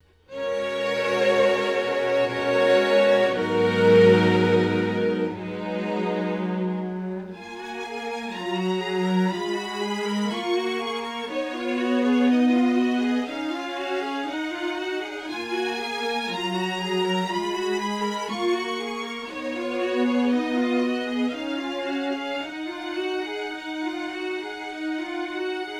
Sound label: strings